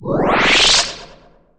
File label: activate Pup; Action; ThinkTanks